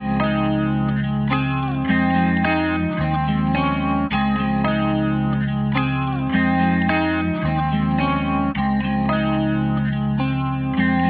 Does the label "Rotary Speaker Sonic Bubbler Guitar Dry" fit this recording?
Yes